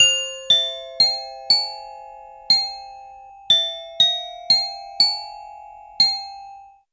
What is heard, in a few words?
Soaring Gull